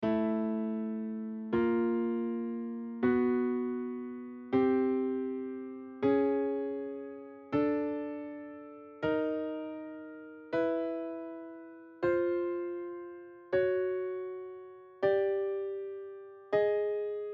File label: All Fifths Equal, tunings